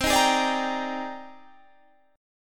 G sharp Major Sharp 11th C, chord

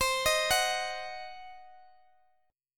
C Diminished C, Guitar Standard; arpeggiated; JGuitar

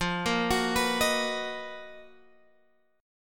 B Major 7th F, chord; Bmaj7/F; arpeggiated

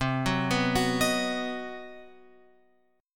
C Minor Major 7th Flat 5th, chord; CmM7b5; {8 9 9 11 X 11} arpeggiated

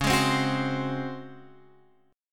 Db Major 7th Suspended 4th, Guitar Open F; DbM7sus4; strummed; x,8,8,7,9,7

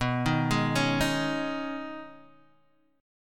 B 6th Add 9th B down Guitar Half Step Down

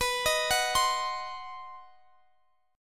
C Minor Major 7th Flat 5th B, chord

